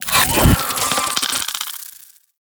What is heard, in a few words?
Sentient Mimic Unfold; Sentient Trooper